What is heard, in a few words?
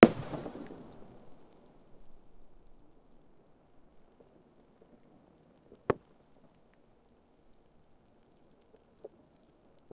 muffled rockfall